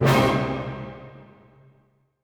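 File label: orchestra; Masterbits - Switch FX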